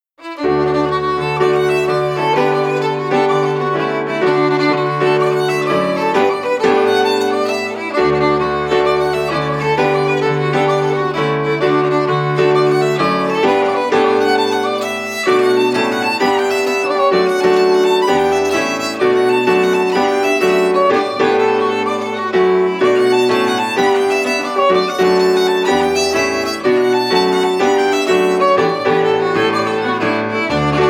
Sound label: Carraroe Jig